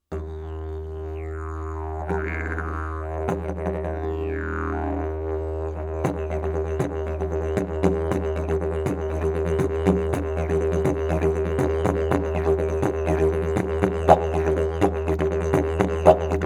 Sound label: didgeridoo